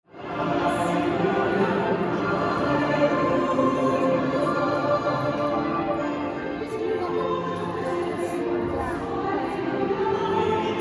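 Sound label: church song, Catholic church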